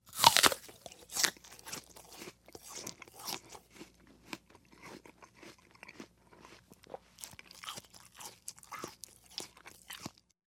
jabloko bystroe zhevanie; Звуки откусывания

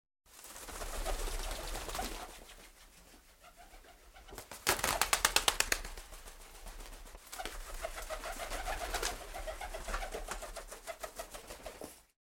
golubi vzletajut i vozvrashhajutsja

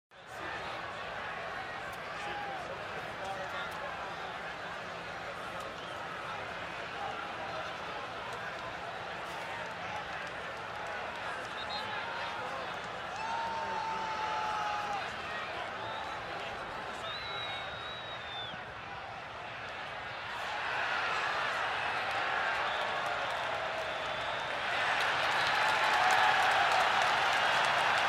spokojnyj atmosfernyj fon tolpy s nebolshimi aplodisentami vo vremja igry; Звуки футбола; Звуки игры в футбол

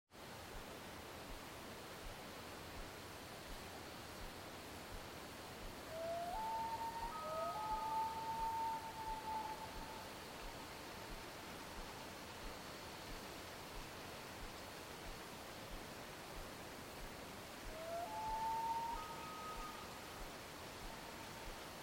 atmosfera v lesu s vetrom i krikami olenej; Звук ветра в лесу; Звук ветра в листве

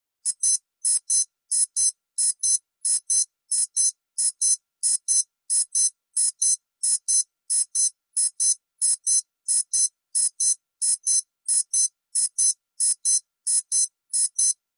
Zvuk budilnika elektronnyh chasov

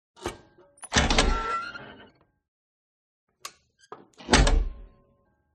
Zvuk otkavania i zakryvania luka tanka; Еще открывание и закрывание люка танка

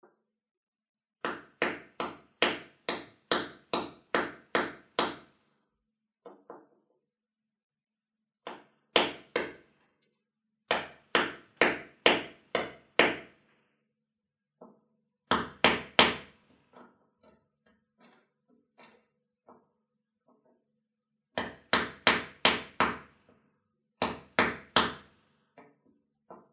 molotok u soseda

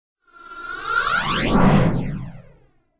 Kosmichesky povorot

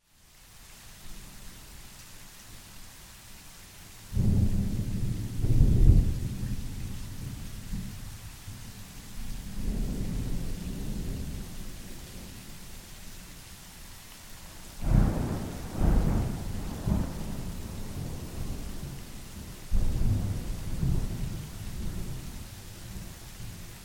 dozhd s gromom

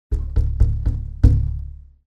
po steklu v dveri; Звук стука в дверь

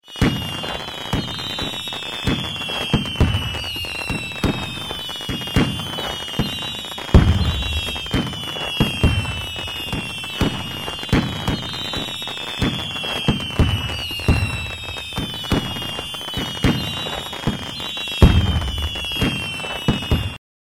nepreryvno svisty vzryvy i gorenie; Звук салюта; Звук фейерверка; звук салюта победы

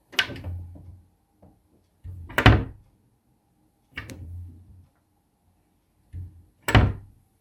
dverca vitriny s bronzovoj ruchkoj

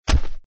korzina zadevaet zemlju

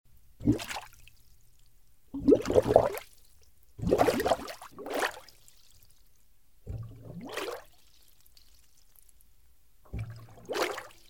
puzyri iz truby v basseyne

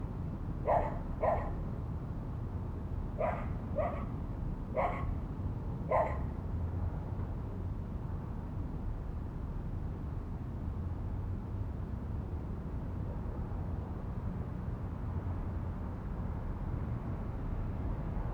noch laj; Звуки города

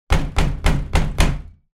shlepkami

